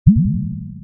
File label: bubbles